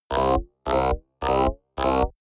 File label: intruder alarm